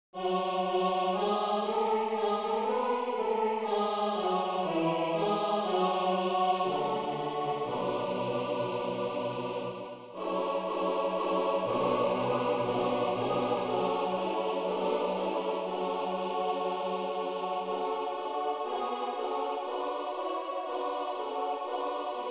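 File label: Prefatory psalm, choir; Prednachinatelnyy psalom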